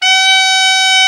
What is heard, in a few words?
BRA ALT, SAX; Best Service Gigapack 1